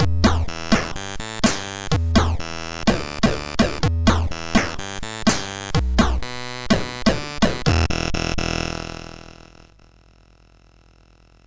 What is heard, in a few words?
MOS6581; MOS6581R2; Awakening